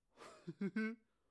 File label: Laughter